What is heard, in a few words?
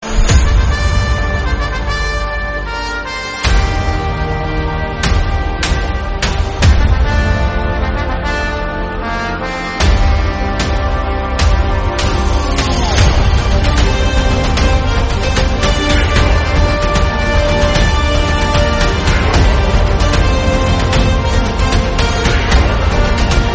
Background Music Ringtone; Jawan Movie